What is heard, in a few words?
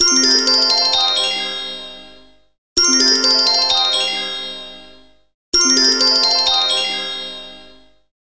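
Arpeggio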